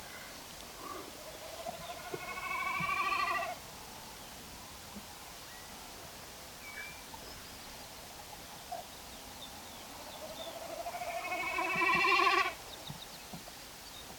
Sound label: Common Snipe drumming; Allenheads; non passerines